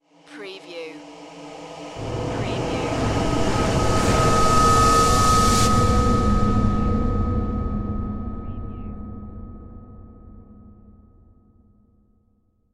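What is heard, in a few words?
Production Element Whoosh Vox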